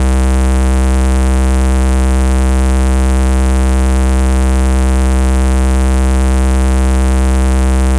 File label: Buzz